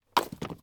Landing On The Ground

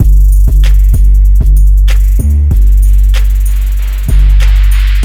Trappy Beat

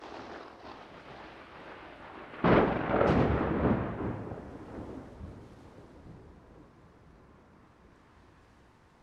Loud Thunder Clap

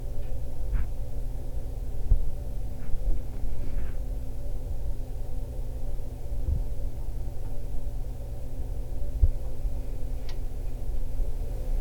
Silence

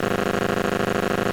Scanner eng

